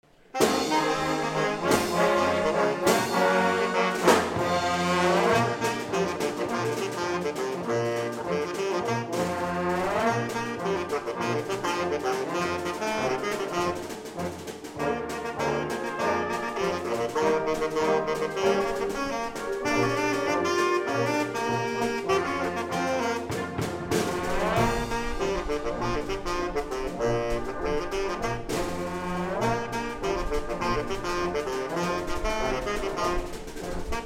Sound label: Sax N Brass